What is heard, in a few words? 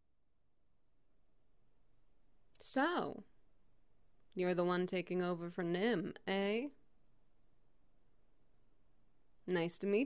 Wet Noises